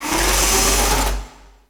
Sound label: Shell Pillar Spin; Warframe; Corpus; Railjack; Comet Shredder